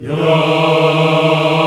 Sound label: VOX African Yas; Roland LCDP11 Africa VOL-1